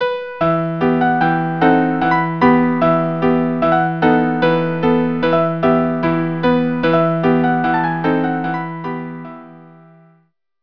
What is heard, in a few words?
quartet